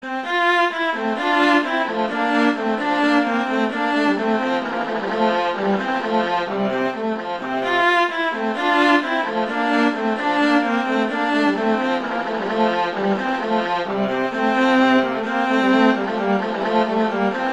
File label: Allegro; Telemann; Canonic; Vla; Sonata 1